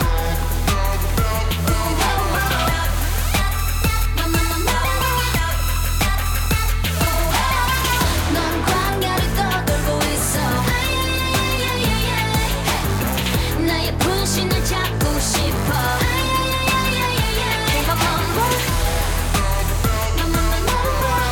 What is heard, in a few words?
Loop; ZmeiGorynich